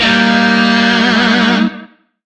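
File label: Shawzin Pentatonic Minor Chord; Music; DE; Warframe; Lizzie Shawzin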